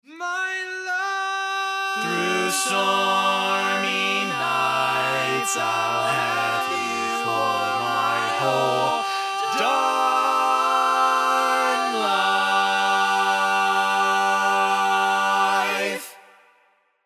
All Parts, barbershop

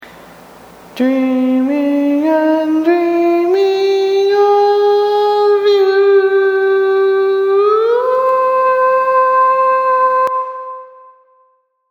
Tenor, barbershop

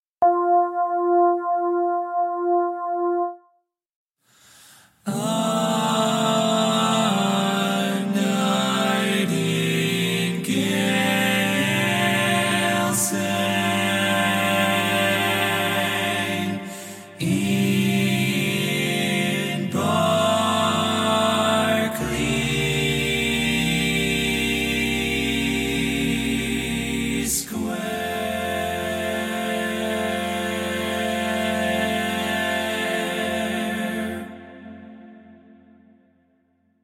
Lead, barbershop

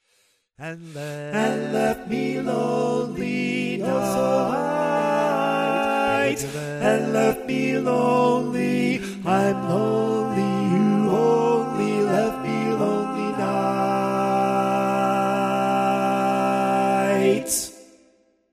Bari, barbershop tags; Upbeat Version

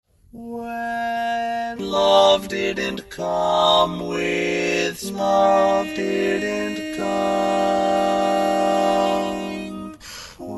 Bass, barbershop tags

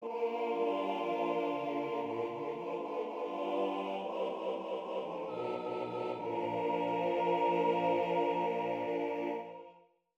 All Parts, barbershop tags

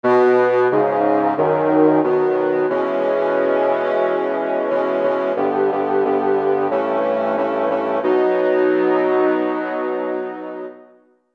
barbershop tags, all parts

